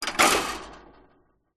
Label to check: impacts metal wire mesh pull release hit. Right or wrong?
Right